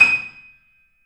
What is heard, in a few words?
D#, The Piano; InVision Interactive - The Piano